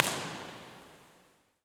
Natatorium, Impulse Response; EchoThief Impulse Response Library

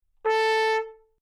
trumpet A4 forte normal

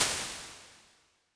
Small Plate, Lexicon 480L Reverb; impulse responses; Big Gee's

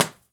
Cliffs; Stanley Park; Impulse Response; EchoThief Impulse Response Library

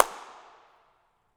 4th floor, Impulse Responses, Reverbs; BU Reverbs

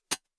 Tremelo L to R, Lexicon 480L Reverb; impulse responses; Big Gee's

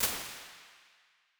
Slap Plate, Lexicon 480L Reverb; impulse responses; Big Gee's

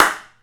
elevator, Impulse Responses, Reverbs; BU Reverbs

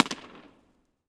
Underpass, Impulse Response; Commerical & 5; EchoThief Impulse Response Library

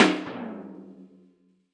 Tunnel; To Hell; Impulse Response; EchoThief Impulse Response Library

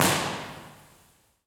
Waterplace Park, Impulse Response; EchoThief Impulse Response Library

